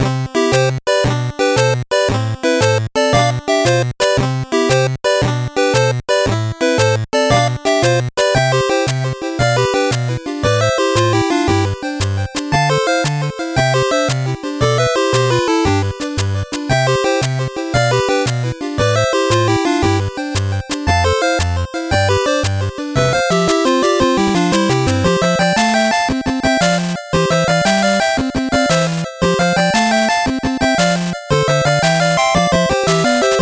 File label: 8bit bgm slow